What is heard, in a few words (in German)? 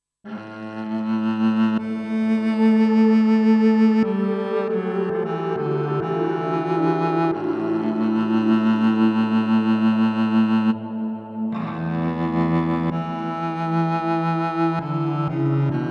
Viola da Gamba mit Pads; NADA Instruments